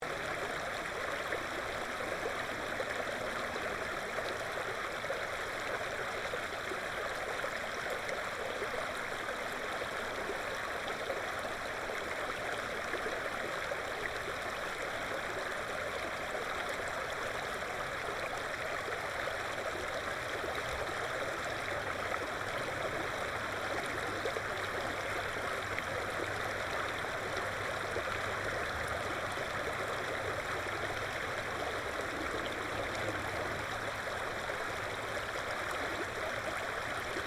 Water Stream Medium Flow Night Loop, Small stream in a country town; Nature